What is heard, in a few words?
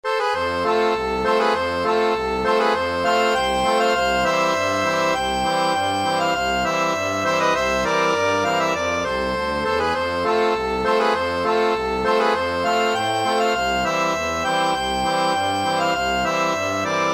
accordion